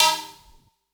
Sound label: attack D#3, Yamaha VP-1; Music Store; VP-ATTACK